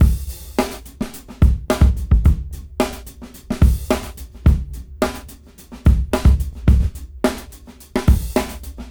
Brown 105 BPM; AMG - Keith LeBlanc Phat Butt Jams

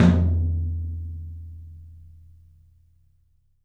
TOM, Studio Toms; 3D Percussives

